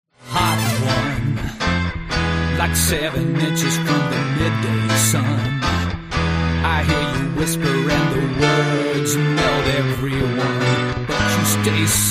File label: play along drums rock pop and blues minus drums